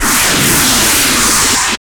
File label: CYBER KIT, DRUMS